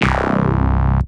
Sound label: Bass; Super Metroid